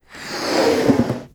Balloon Inflate; openclonk

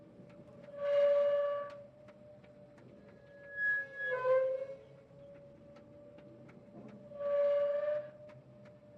Pumpjack; Structures; openclonk

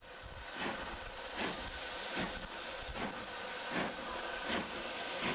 Steam Engine; openclonk

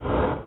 Gate Move, Stone Gate; Structures; openclonk

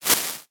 Spark, Fire; openclonk

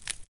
Fossilize, Lava Core; Animals; openclonk

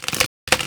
Tree Crack; openclonk

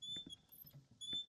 Hinge Creak; Structures; openclonk

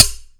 Light Metal Hit, Metal; Hits; Materials

